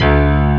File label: D. PIANO C0